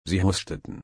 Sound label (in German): husten